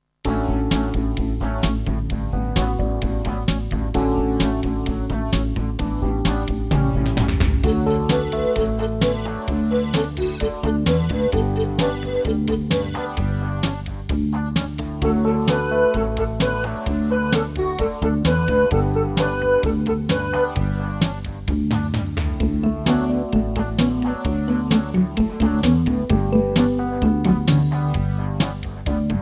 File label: Song (Instrumental); Spotlight 2